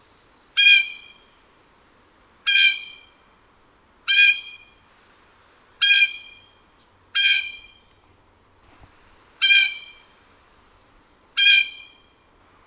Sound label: blue jay variasi call